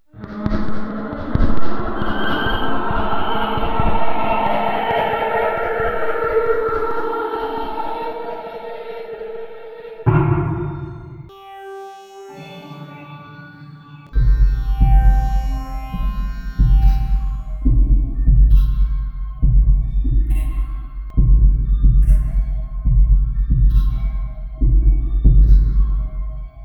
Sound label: spooky